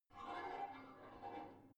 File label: barbell heavy plate pull out slow, fitnessstudio; sport freizeit